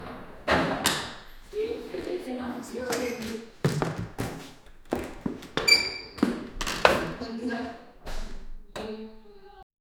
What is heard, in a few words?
russian school classroom, chair scrape